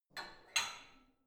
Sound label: barbell only replace, fitnessstudio